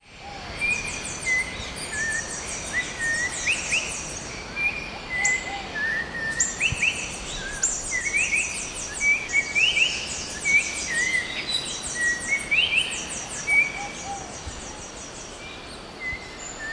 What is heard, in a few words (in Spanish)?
turpial dorsidorado icterus chrysater